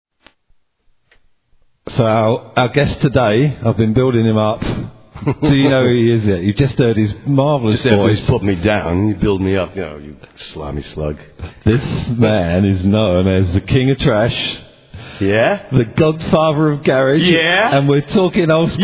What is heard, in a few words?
Punk Rock radio